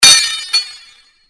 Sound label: Sword Sound; FXHome; Fighting Sounds